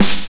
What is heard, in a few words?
Expand Snare; Sonic Foundry (Sony Creative Software); Drums & Drones; Construction Kits